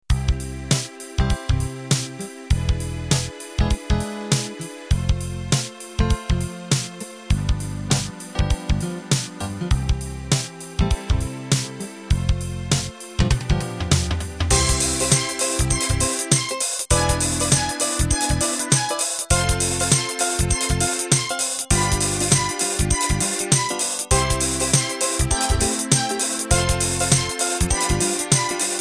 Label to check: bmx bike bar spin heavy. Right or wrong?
Wrong